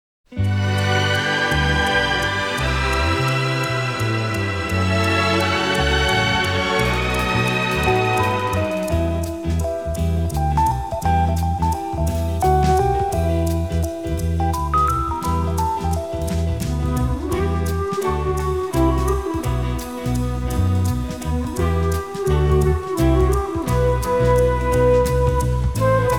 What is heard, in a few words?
Strings